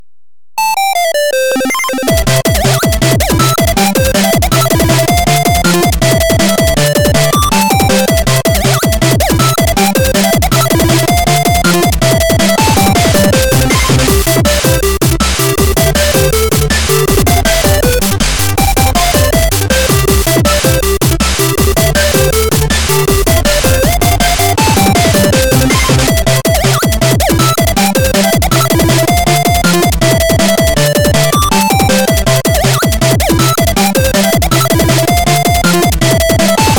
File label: jingle bells, chipmusic